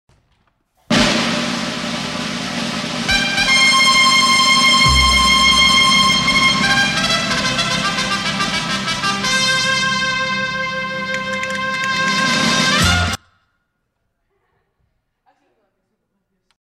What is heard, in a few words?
Announcement trumpets short